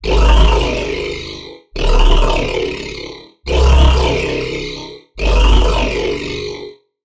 Growl; Anura; Routed Sound; Vital Expansion - Chaos Bringer Vol.1

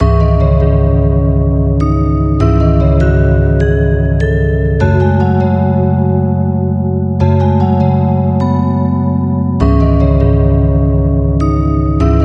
Key; Routed Sound; Spire Expansion - Street Vanquisher Vol.1

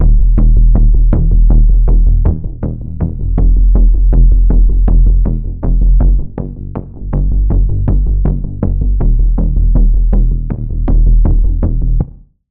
Pulse; Epicenter; Routed Sound; Vital Expansion - Quantum Enforcer Vol.1

